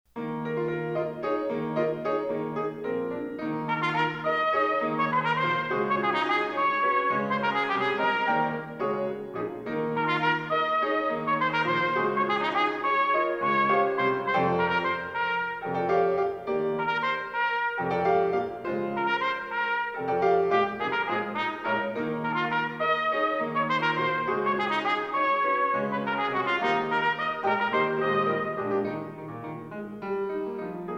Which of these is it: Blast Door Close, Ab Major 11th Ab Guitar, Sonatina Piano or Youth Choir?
Sonatina Piano